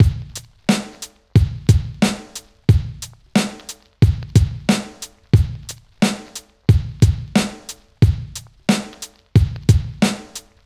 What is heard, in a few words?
90s hiphop loop 90; Reference beat bpm90